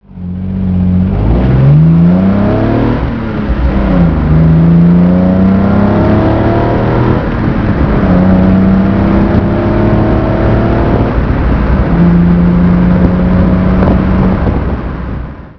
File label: fiat dino drive